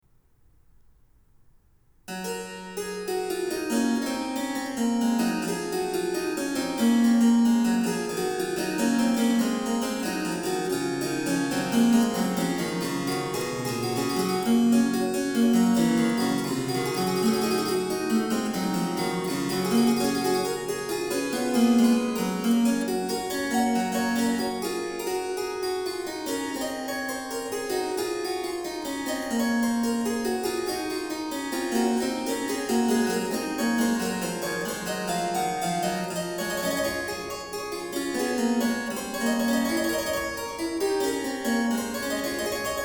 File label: Harpsichord; Bach, J S; Goldberg Variations; BWV 988